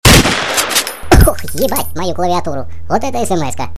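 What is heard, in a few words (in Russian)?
SMS klaviatura, keyboard; прикол на смс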